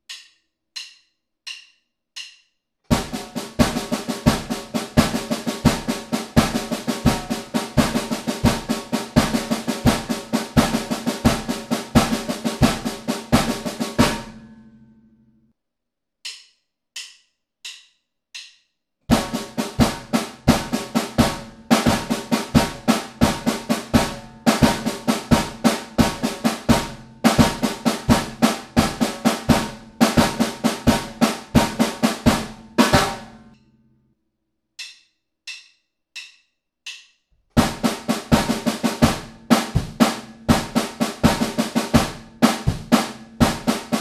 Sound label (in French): triolet